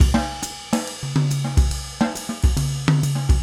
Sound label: trip jazz 140